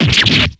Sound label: stab